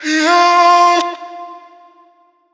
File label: Omnivox oneshot F, Vocal; Slate Digital Omnivox Vocal Sample Pack